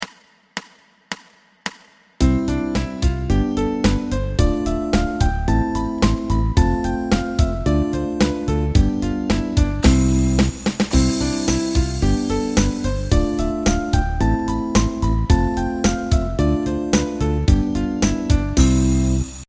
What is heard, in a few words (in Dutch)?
C Toonladder